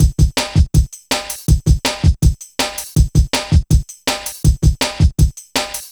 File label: Loops, breaks; M8